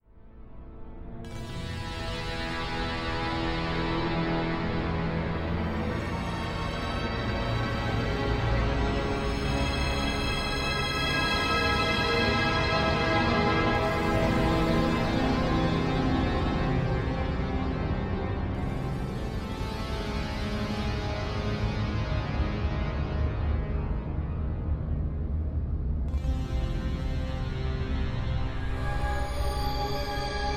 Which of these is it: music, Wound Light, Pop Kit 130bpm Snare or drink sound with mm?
music